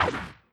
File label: laser; casualtiesunknown